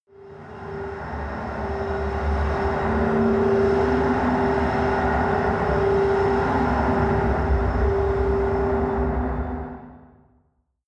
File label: machine room howl